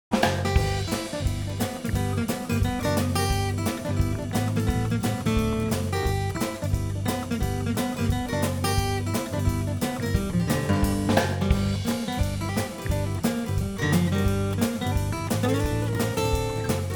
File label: Flatpicking